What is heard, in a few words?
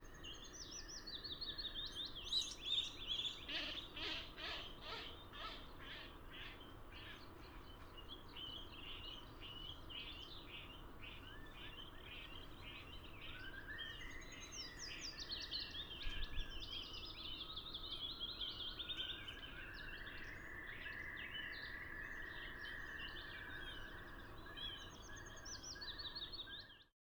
Short eared Owl Calls associated with distraction display; asio flammeus